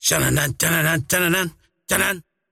singing; dota; largo

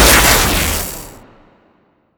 plasma field impact; weapons; dota; razor

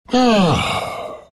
drag happy; dota

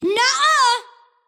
deny pain; kidvoker; dota; invoker

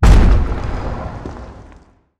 rock impact; weapons; dota; primal beast

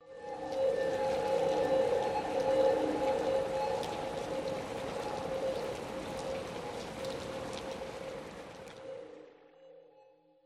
rain of destiny; weapons; dota; oracle

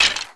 skulls, footsteps; witchdoctor

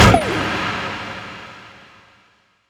sharpshooter cast; weapons; dota; hoodwink

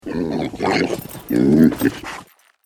boar spawn; npc; courier

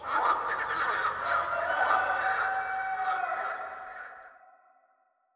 morning cavern, ambient; dota

